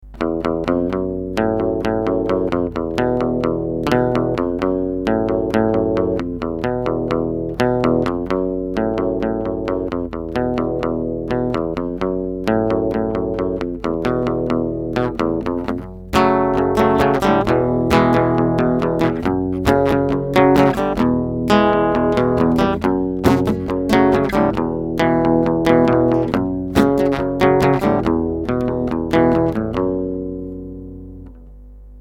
guitar

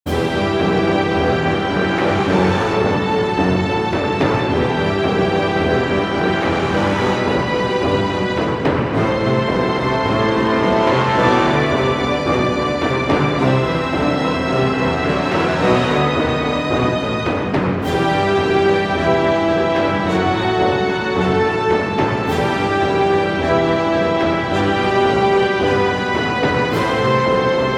radio music

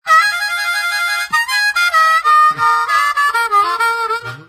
harmonica

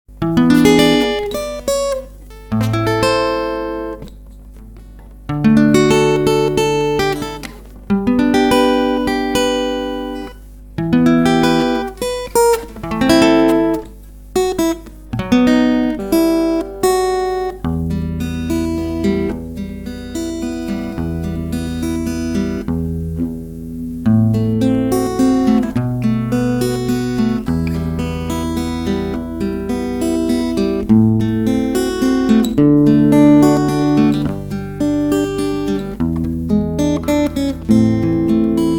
guitar

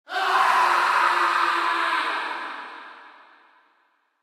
scream

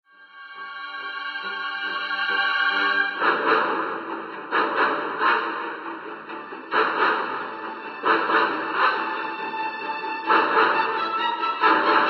megafon music

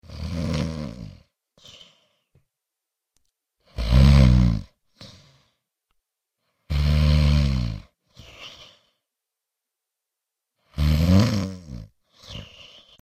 sleep

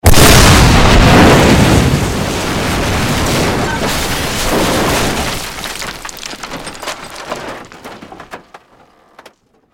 explosion

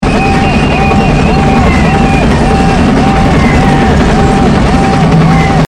vert down

